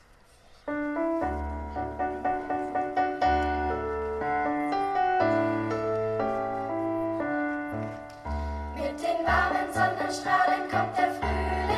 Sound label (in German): Unterstufenchor Tiritomba Traditional Italien